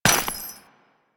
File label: Step Ice, footsteps; Warframe; Grineer Royal Jester; Foley